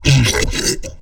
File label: die, aliens; ortnok